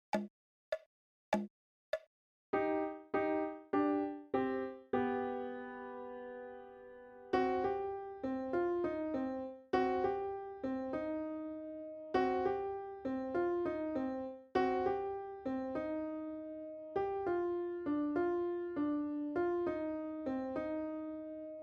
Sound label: Blues right